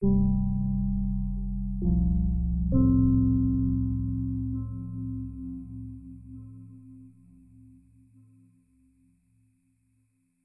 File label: Startup; Windows Never Released Soundpack 1